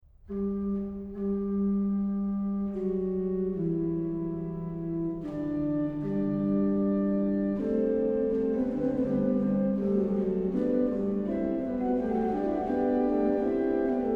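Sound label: O Herre Gott, dein göttlich's Wort, organ; BACH; BACH ORGAN WORKS; CHORALE PRELUDES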